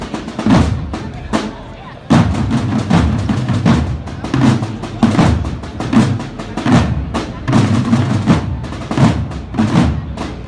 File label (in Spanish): Bolero Militar